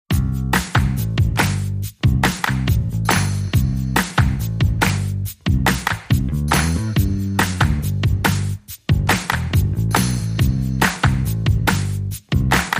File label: Drums and Bass